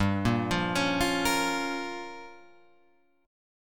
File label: G Minor 7th Flat 5th, Guitar Open F; Gm7b5; arpeggiated; 7,5,5,8,8,5